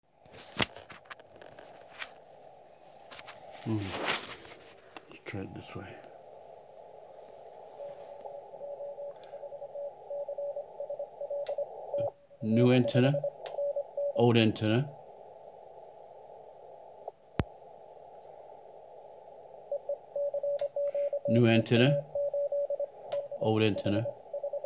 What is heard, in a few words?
18m Signal Comparison